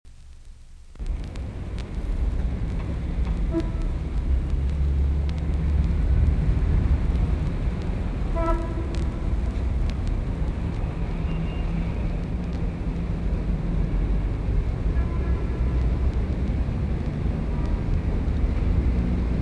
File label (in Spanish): ciud